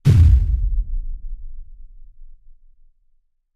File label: synth effects boom, sci-fi booms